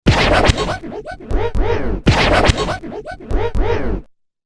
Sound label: Turntable; Soundzabound